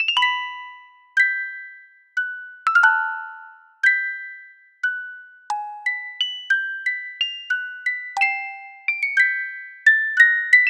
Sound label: Music Box